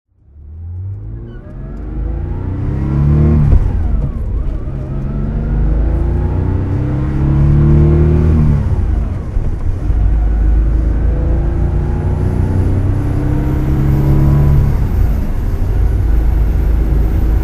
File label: porsche 911 24T driving